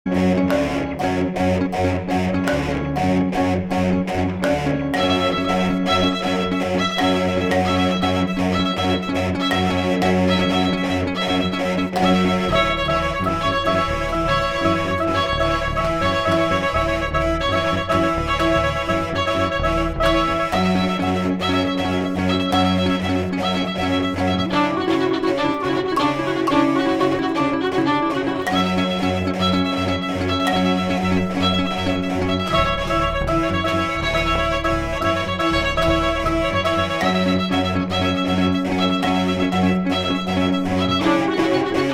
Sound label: alarm will sound